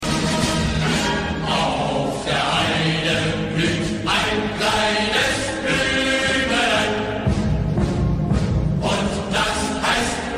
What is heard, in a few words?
German march